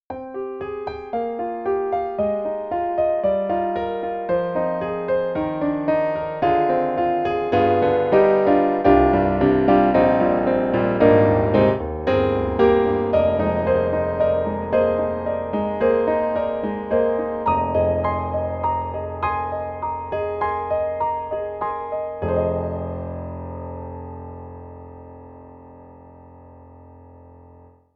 Perfect Grand; Roland; SRX-11; XV-5050

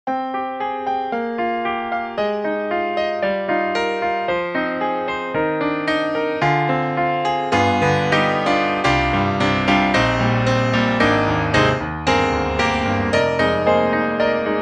White Grand; VI ONE

